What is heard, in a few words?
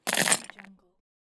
large iron chain drop on concrete